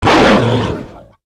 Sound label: Attack